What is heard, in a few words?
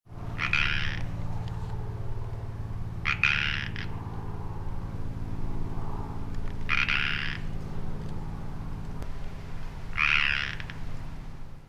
pacific tree frog; birds